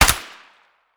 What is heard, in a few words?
fire suppressed, weapons; cw m249